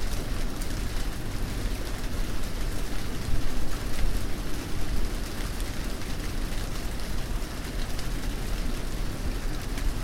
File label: rain, nature